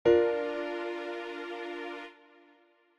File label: Bb Maj Inversions 1st